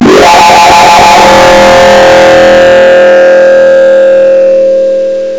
sting; driller; slender

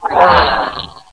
pain; cof

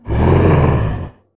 alert, male zombie; nmrih; zerosiki; slender

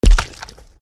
walk, footsteps

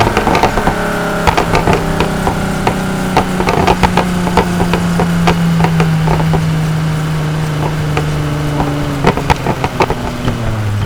revdown; nfs heat ganimator pack

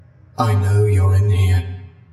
cheers; 049; scp sf

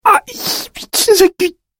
stabbed; freak fortress 2; library of ruina; roland

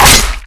hit; slender; team killer

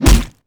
hit sound; lethalcompany; zerosiki; slender

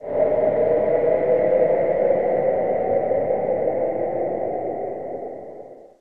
skinwalker flesh gait stinger; slender skinwalker

